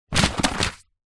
household telephone directory yellow pages drop down